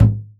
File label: old school low floor tom single hit A key; tom drum